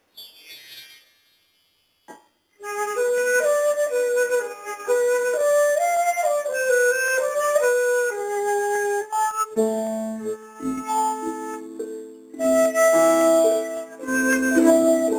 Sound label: impressionism improvisation